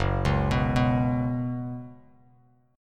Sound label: Gb Suspended 4th, Bass Standard; arpeggiated; JGuitar